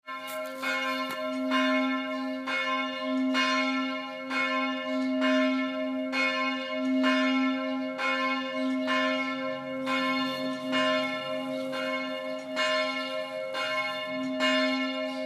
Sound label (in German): Glocke